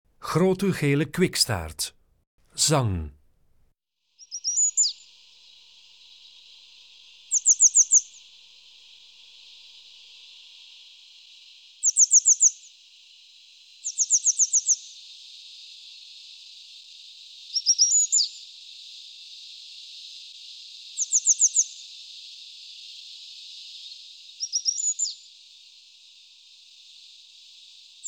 Grote gele kwikstaart, Zangvogels; IVN

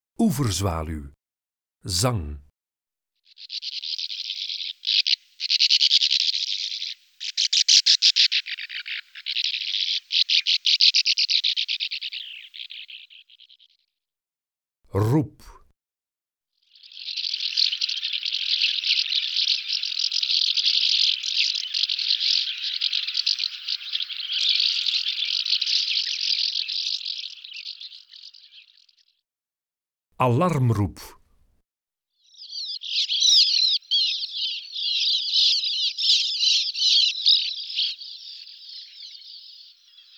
Oeverzwaluw, Zangvogels; IVN

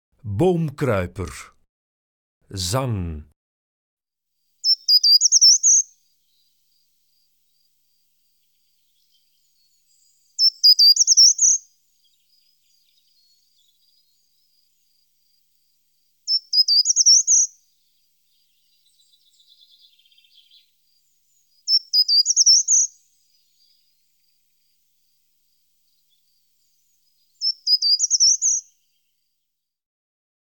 Boomkruiper, Zangvogels; IVN

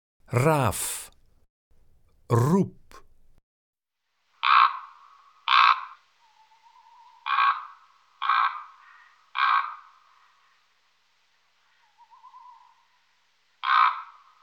Raaf, Zangvogels; IVN

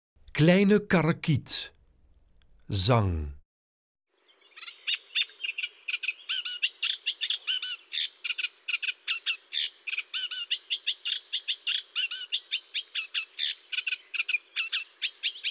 Kleine Karekiet, Zangvogels; IVN

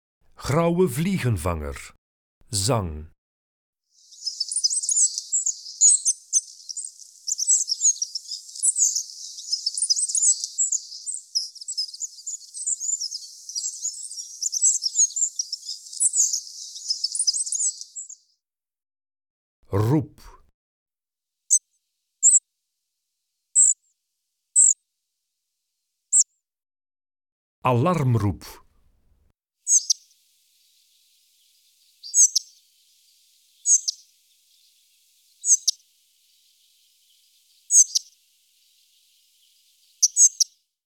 Grauwe vliegenvanger, Zangvogels; IVN

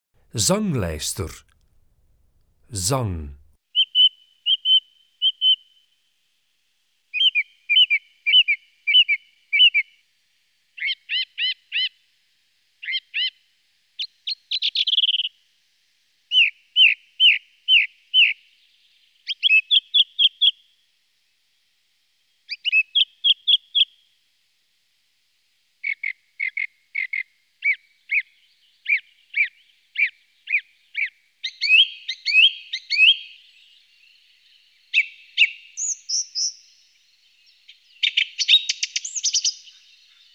Zanglijster, Zangvogels; IVN

